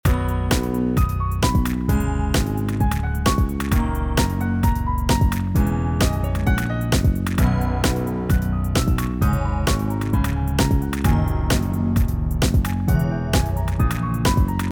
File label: mix front to back original piano; 钢琴原声; 原始伴奏，钢琴还未经处理; Waves